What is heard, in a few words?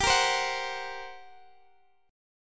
G Minor Major 7th Double Flat 5th, G, down, Guitar, Open D